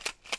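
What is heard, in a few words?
rifle cock; Media-Pedia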